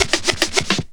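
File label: scratch, record; XP RECORD-FX; Best Service; XXL Production Effects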